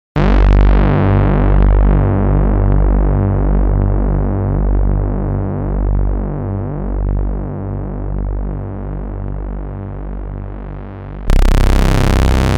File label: mini dry bass